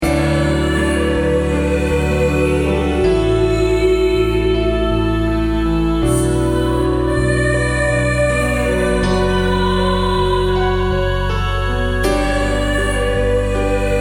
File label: Healing Melody